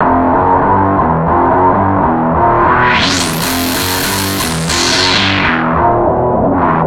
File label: Treat Guit, Industrial Nasty